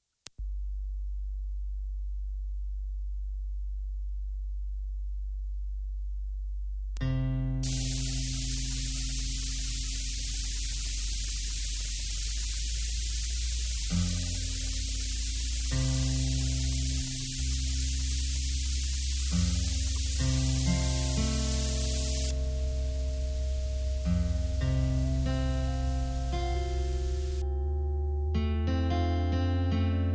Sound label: handy Noise